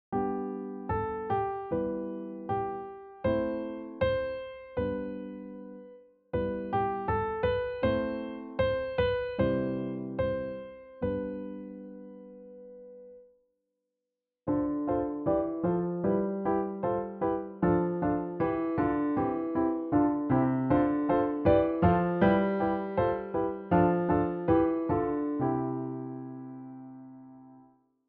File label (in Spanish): Piezas con acordes